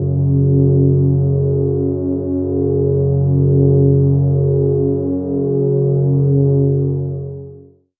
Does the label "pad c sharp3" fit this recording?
Yes